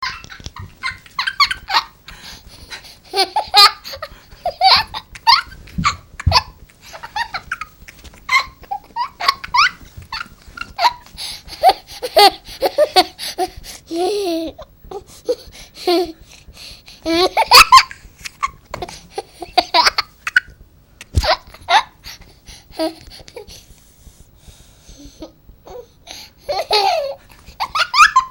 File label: laughing